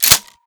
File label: rack, weapons 870; arccw ud